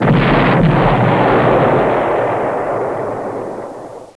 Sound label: big gun; civ2; wwii